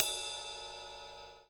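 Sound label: Ride Softest, cymbal; hydrogen drum samples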